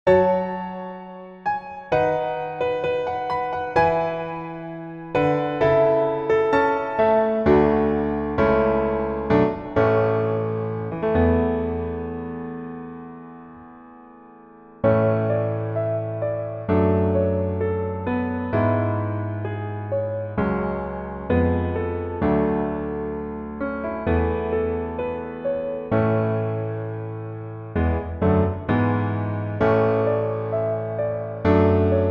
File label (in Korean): Piano MR 멜로디MR -1키